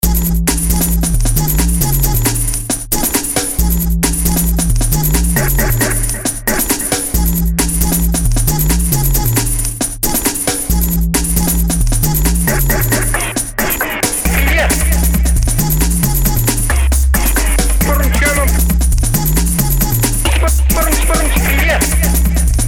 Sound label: jungle, Bass hot mix